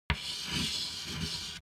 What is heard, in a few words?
write sound